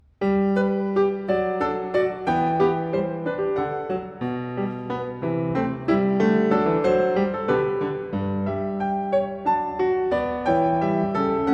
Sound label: Goldberg Variations Var. 4. a 1 Clav; Bach; BWV 988; Live at Carnegie Hall, New York, 2025